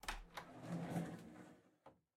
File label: Door Open Long; Game rip; MiSide